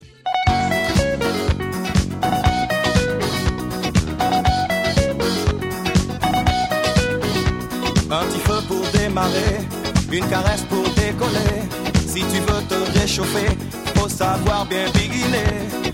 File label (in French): Mégamix